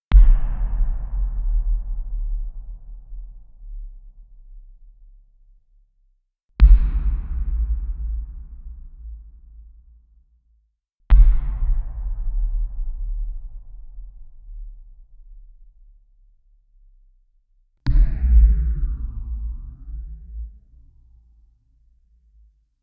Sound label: Cinematic Hits